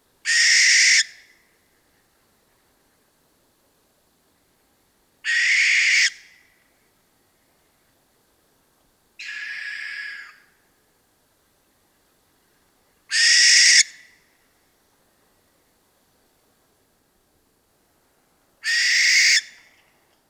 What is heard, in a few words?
barn owl